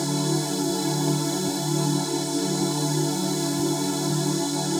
Pad Loop D, shimmer and sparkle

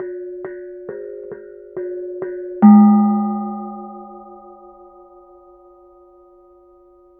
KENONG; Spectrasonics; Heart of Asia; KENONG PH

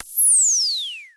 Dual-Percussion-Synthesiser; Klone

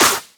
Claps; Vengeance; Essential Clubsounds Vol.4

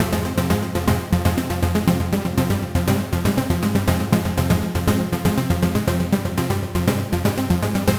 Trance Synth

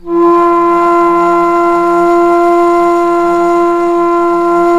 BASS F2, BASSFLUTE; Historical Instruments; AKAI; USB Soundscan vol.23

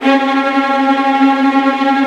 VIOLINT D#, Synclavier Strings; ILIO

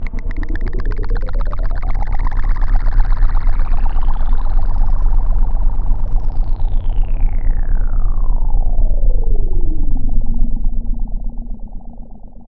BLEEPERS, DIGITAL FX; Zero-G Ambient 1

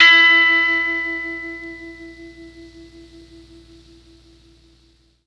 E3 GUITAR, Mellotron MKII; Mike Pinder - Mellotron Archive CD

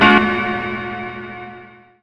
Piano, Underground Hip Hop; USB Soundscan vol.02; AKAI

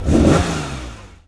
CAR START, CARS

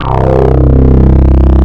REVERSE REZ, TWAV BASS; Soundengine - CSR-000 The Art of Transwaves

